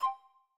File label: back style 5; inteface sfx pack 1; Back tones